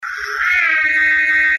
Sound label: Ecto Siren 1 Time Loopable